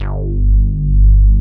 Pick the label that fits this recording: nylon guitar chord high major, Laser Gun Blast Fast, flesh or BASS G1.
BASS G1